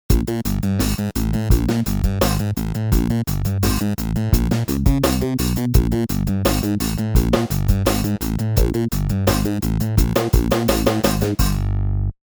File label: Bass Disco Poly Synth Analog Clav; Ultra Analog Session